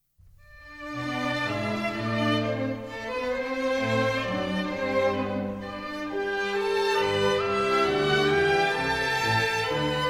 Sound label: Minuets, G major; Mozart; Dances and Marches